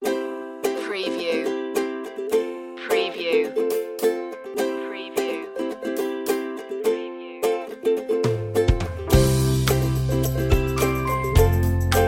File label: ukulele commercial sunny rays